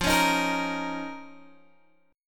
F 7th Suspended 4th Sharp 5th F down Guitar Open G